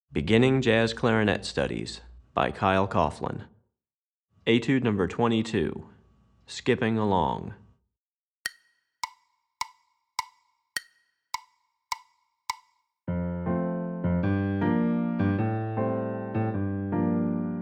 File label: Piano; Recordings For Beginning Jazz Clarinet Studies, Volume 2; Piano Accompaniment for Etude #22; Running Eighth Notes